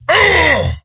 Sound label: Wound; Kologarn